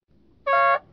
Porsche Horn

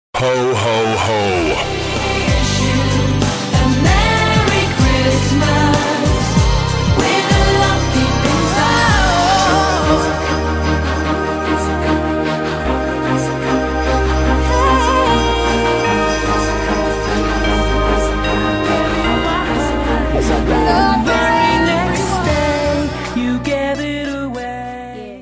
x mas mix

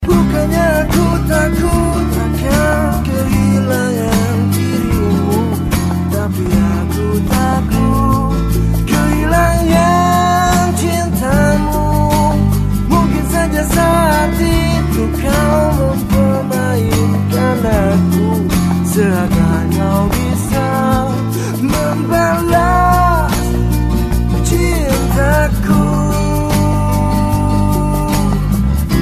Is Calling Rap

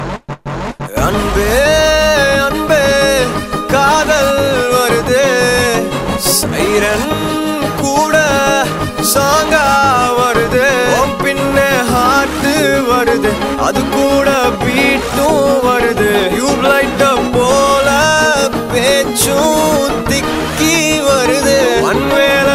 voice song

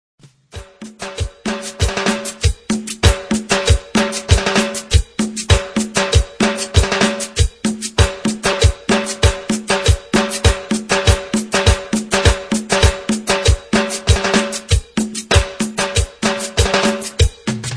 tejano cumbia mix

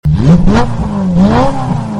ae86 engine starts

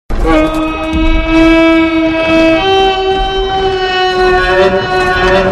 horn, sms; ringtones; haradrim

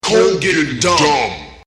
old school hip hop

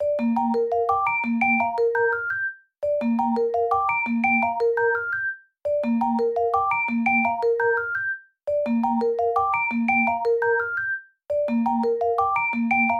Phone D Ringtone

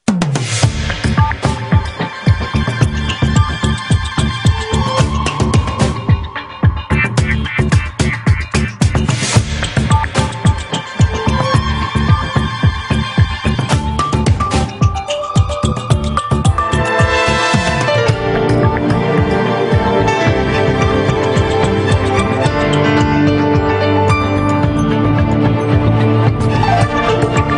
News Sounder

